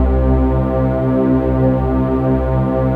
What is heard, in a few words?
PAD, Dance Mega Synth; Best Service